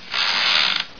creak; de arizona

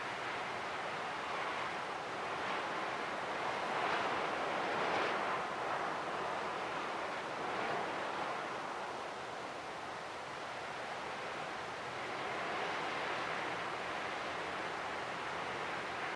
wind gusty; docks

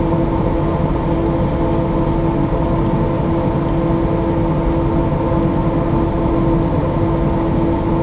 fan; de Nostromo

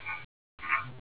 tick tack

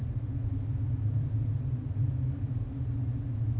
catwalk sound

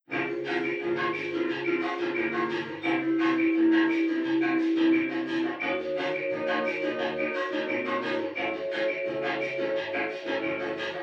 elev song

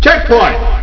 carma point; de carmageddon

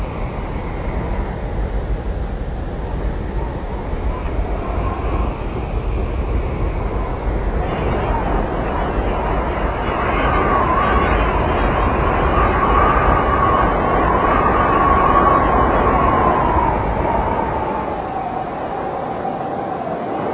wind cold

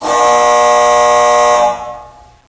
KLAXON AIRLOCK

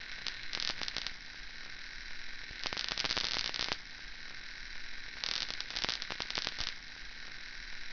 roof neon loop; de code

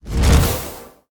item respawn

